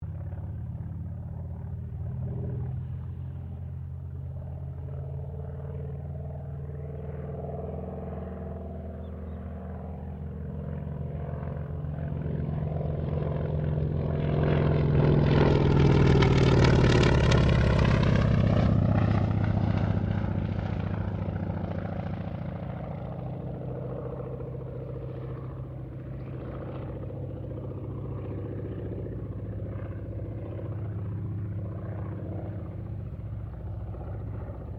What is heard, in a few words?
AIRCRAFT CA-28 CERES PASS; Airplanes; Vehicles